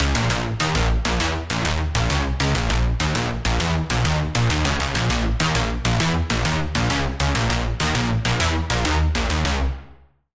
Pluck, Sylenth1; Routed Sound; Sylenth1 Expansion - Doom Carpenter Vol.1

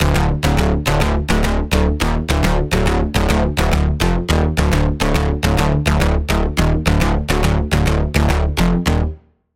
Bass, Sylenth1

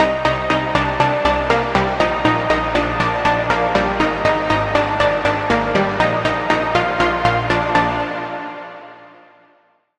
Pluck; Cyber Resonance; Routed Sound; Vital Expansion - Reality Maker Vol.1

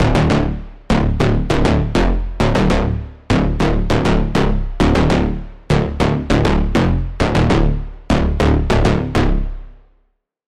Bass; Hell Flame; Routed Sound; Vital Expansion - Doom Carpenter Vol.1

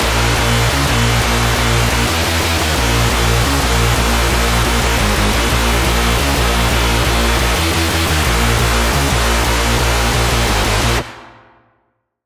Lead; Routed Sound; Spire Expansion - Chaos Bringer Vol.1

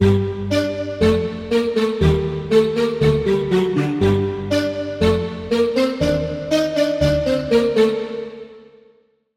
Vox; Routed Sound; Serum 2 Expansion - Story Walker Vol.1

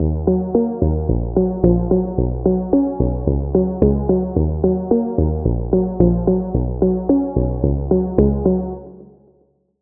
Pluck, Spire; Routed Sound; Spire Expansion - Star Scavenger Vol.1

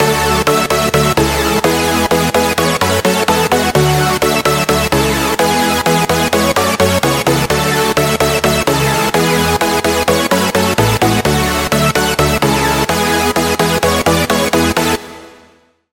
Chord

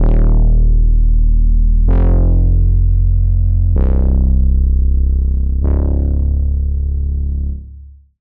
Bass; Routed Sound; Serum 2 Expansion - Star Scavenger Vol.1

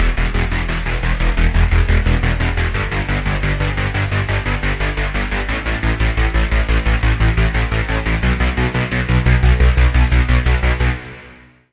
Lead, Serum 2; Routed Sound; Serum 2 Expansion - Quantum Enforcer Vol.1

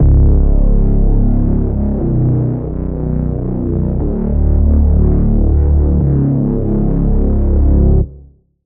Reese; Vital Expansion; Street Vanquisher Vol.1; Routed Sound

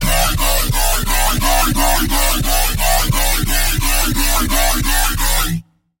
Wobble; The Operator; Routed Sound; Serum 2 Expansion - Chaos Bringer Vol.1

